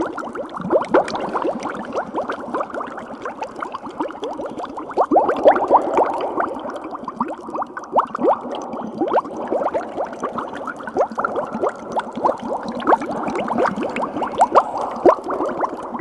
bubbles; brainpipe

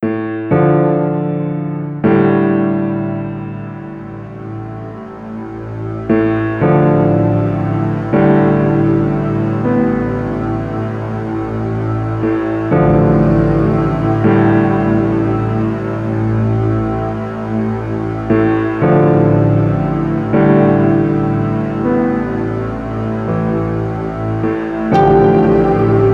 atmosphere; barony

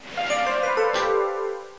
Conveyor out; School; Fisher Price Ready for School Kindergarten

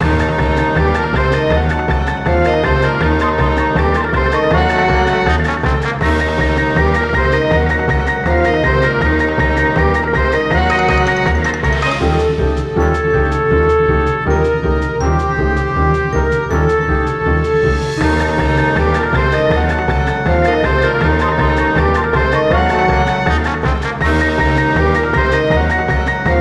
Guit; simpsons hit run; ps2

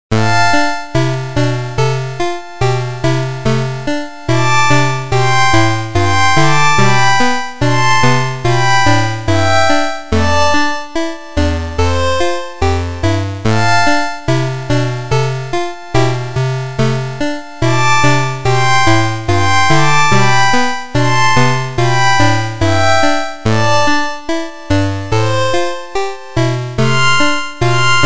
Cave; Alchemic Dungeons

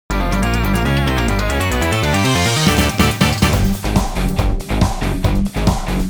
Nest Battle Middle intro; vivid knight switch windows gamerip 2021

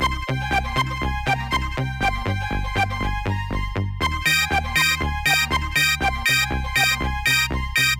Aerobics; Dreamer Series Top Model; 2009 nds gamerip

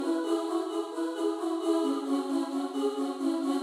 the cave music ground; fujii ps4 windows gamerip 2019

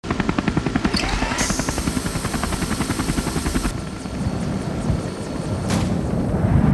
Sleeping Gas; Infiltrating The Airship

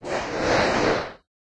Burn

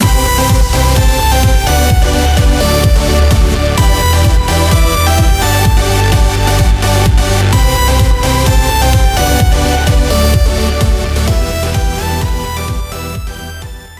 Stinger; DFH Stadium

